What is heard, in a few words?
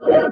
UI SWOOSH